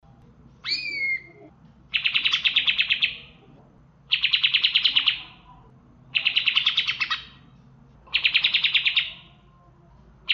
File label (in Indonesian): cucak ijo isian ciblek